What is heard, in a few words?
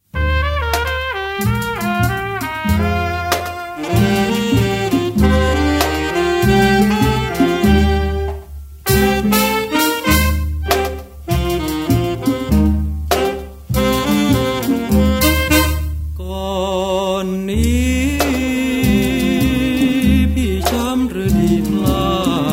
Off Beat